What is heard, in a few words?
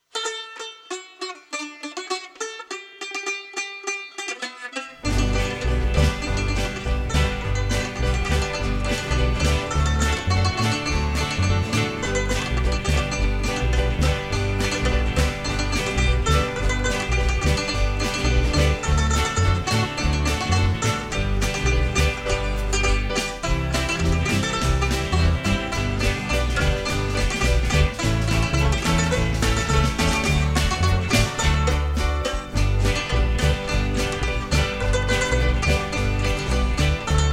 banjolein